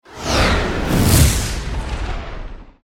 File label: Whoosh in a hollow tube descending and departing away to a hit with energy; 能量音效